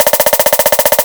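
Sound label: Orchestra Snare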